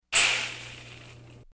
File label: charge electric hit